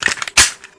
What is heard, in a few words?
mk18 mag in; tf2classic